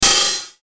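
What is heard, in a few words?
Musical Element, Drum Snare March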